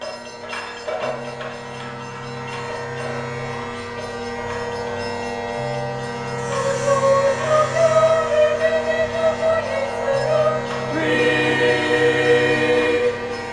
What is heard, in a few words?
Meditation